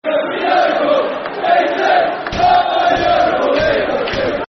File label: We Love You Yeovil, Football Chant; Yeovil Town Ytfc; fanchants